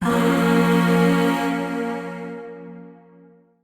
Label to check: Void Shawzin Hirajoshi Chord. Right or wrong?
Right